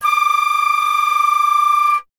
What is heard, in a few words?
flute D; Miroslav Vitous - Solo Instruments VOL-2; Solo lnst. II